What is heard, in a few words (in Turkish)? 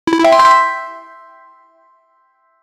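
tıklayınca altın gelen oyun, collectible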